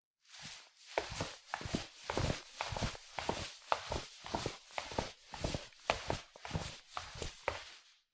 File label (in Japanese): フローリングを歩く